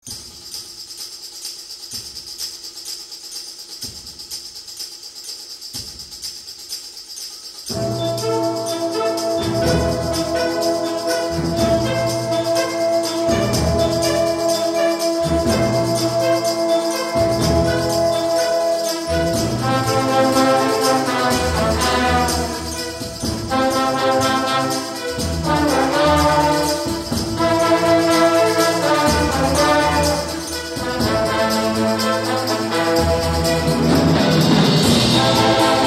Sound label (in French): Instrumental